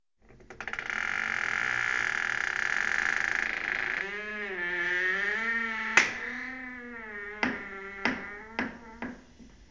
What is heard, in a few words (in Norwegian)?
knirk